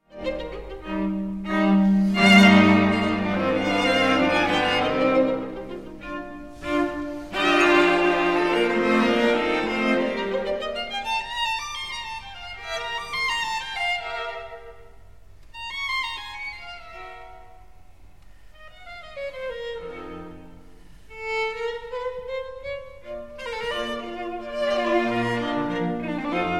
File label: string quintet in E flat major; wolfgang amadeus mozart